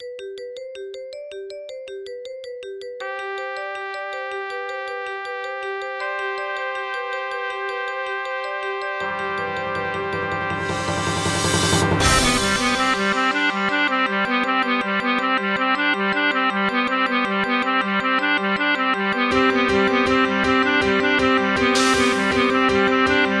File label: Soaring Percussion Improvation